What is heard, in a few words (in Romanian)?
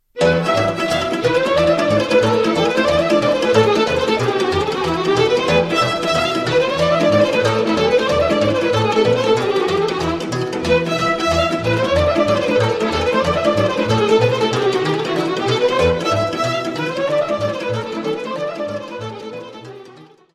Sirba de la Nicoresti, Roumanie